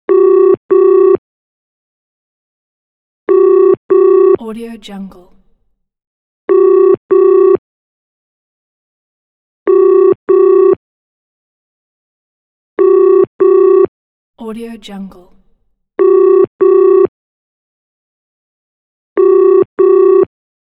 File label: english phone ring through ear piece